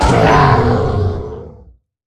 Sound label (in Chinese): 死亡声; 劫掠兽